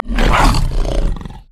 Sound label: swipe efforts; nidalee base